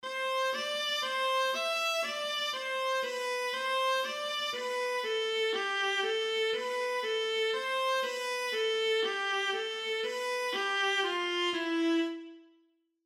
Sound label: Alto Clef Example Viola